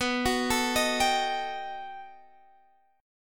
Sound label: B 7th Flat 13th B, guitar chord